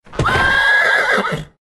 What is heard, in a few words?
Horse; Diorama